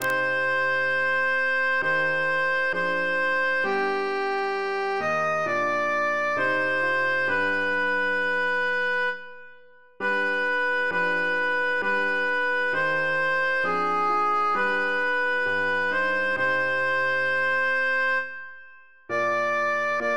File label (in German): sopran